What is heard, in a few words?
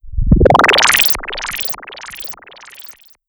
Alien World Hi Tech